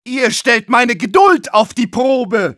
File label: medic jeers, de